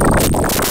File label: dig in